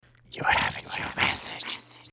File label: scary email alert sound